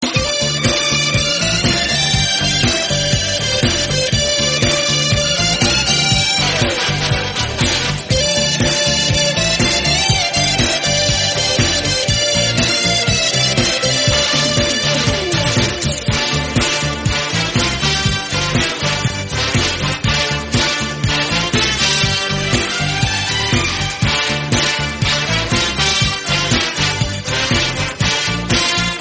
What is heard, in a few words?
guitar tune, ringtone; baazigar